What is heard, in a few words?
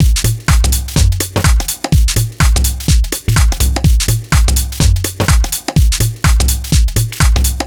Full Loop 125, Loungin House; Equipped Music